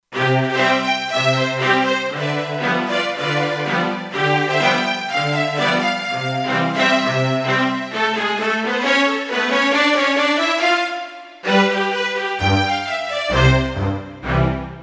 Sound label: Orchestra, Kurzweil K2500X; Factory Programs